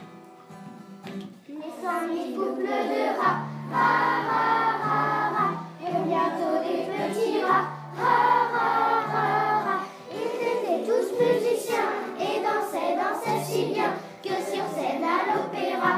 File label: tango